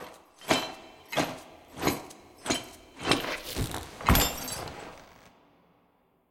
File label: sleep enter phase